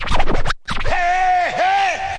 turntable hey hey, Scratching and Turntablism

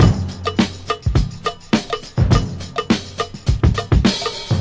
104 bpm round the cowbell, drum loops and breaks

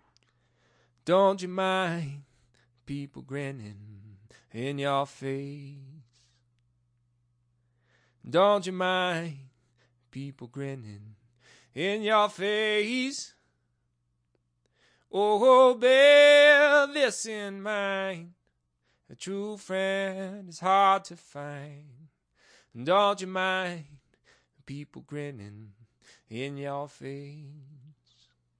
132 4bars vocal loop, blues; remixable collections

